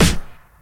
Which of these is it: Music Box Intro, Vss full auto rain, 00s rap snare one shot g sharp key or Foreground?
00s rap snare one shot g sharp key